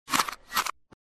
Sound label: reload, reverse